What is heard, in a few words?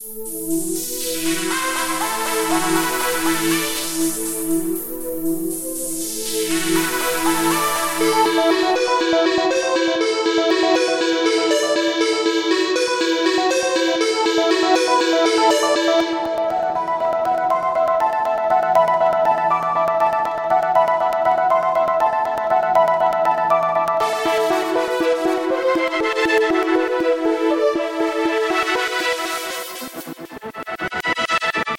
gated